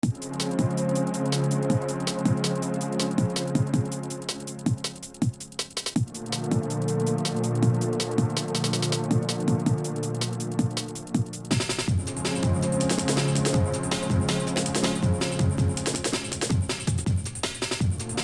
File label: stabs